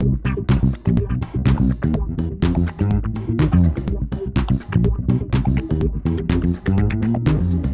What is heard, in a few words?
Acid Funk Intro